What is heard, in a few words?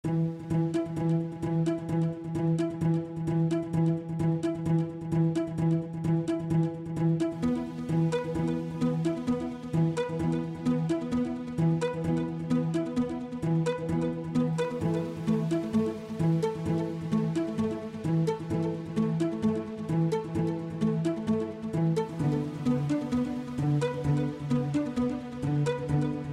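A Forest Adventure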